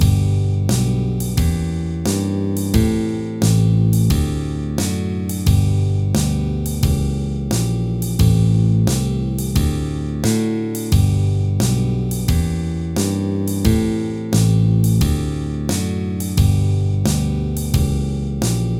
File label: slowfox